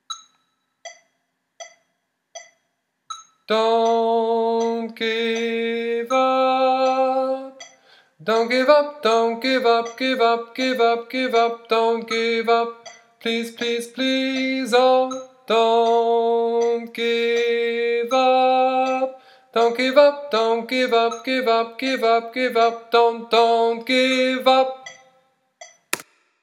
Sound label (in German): Endteil Sopran oktaviert